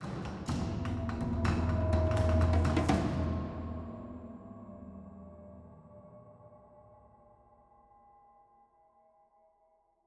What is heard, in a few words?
End 125bpm; Legends of Pegasus; windows gamerip 2012